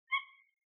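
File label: Great Gray Owl female reap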